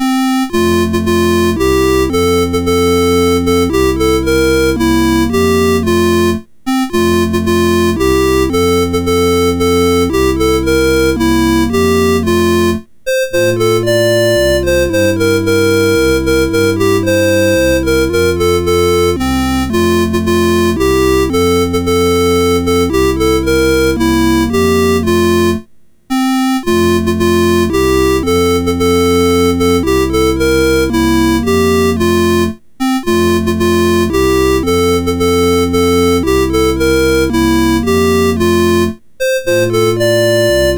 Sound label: O Tannenbaum, MOS6581R4